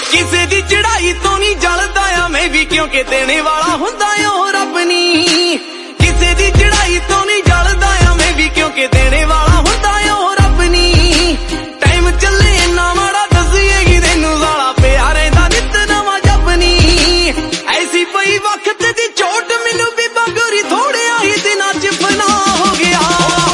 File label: Punjabi Song Ringtone